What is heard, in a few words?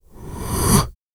VOX FX, BREATH FX